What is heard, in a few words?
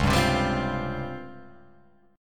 Db Major 7th Flat 5th; x,4,5,5,7,7,7,x; DbM7b5 strummed; JGuitar